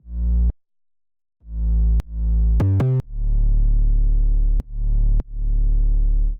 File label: Bass